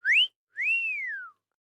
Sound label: whistle